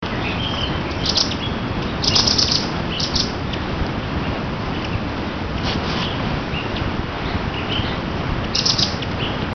velvet fronted nuthatch

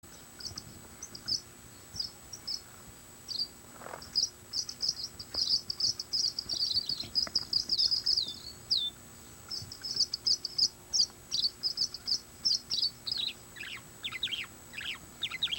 tawny headed swallow

